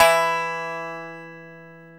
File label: TZOURAS E 2, Mediteranean Traditions; Soundscan vol.53; AKAI